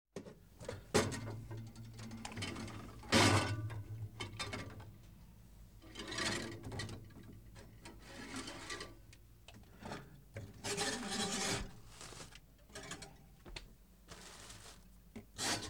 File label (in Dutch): Voorwerpen In Ijskast Verplaatsen; KMP Film Video Sound Effects